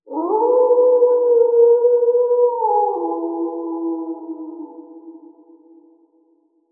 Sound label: Wolf Howling